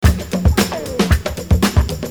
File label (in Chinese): 动感音乐